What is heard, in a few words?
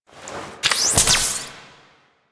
Heal Ability Cast; Warframe; Quests; The New War; Drifter; Abilities